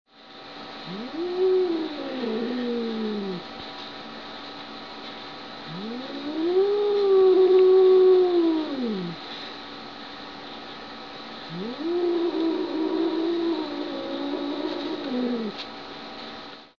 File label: Gwens Growl